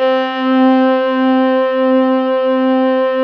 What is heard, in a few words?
CELLOS C4-L, CELLOS